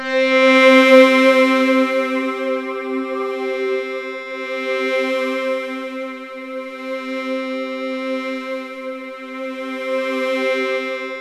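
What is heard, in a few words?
DEEP ATMOS, Kurzweil K2000; BASIC-KW2000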